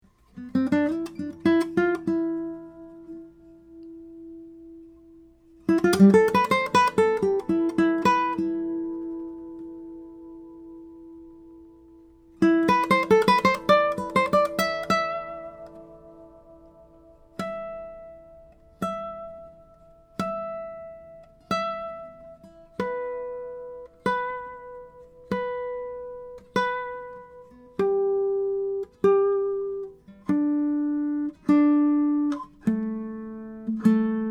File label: Intonation; Bartolex